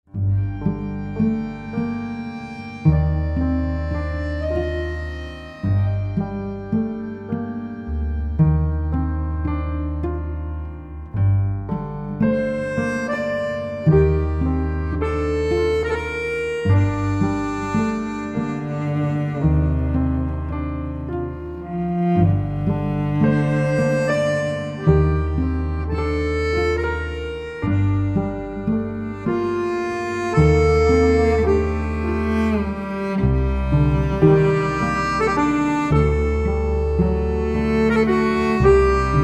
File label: waves, film scores